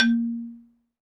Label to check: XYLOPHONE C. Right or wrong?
Right